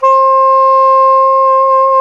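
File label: saxophone soprano saxophone; Roland - LA Composer vol. 1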